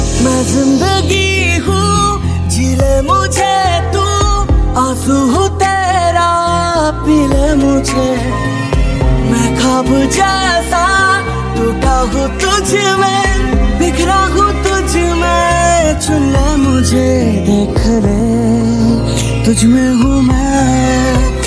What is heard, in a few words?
bollywood sad music ringtone